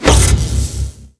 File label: warp; slender